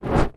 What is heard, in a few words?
Torch Movement; torches